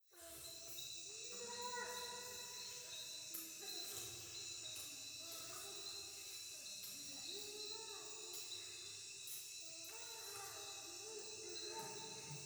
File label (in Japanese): 風呂 イラマチオ 山奥 夜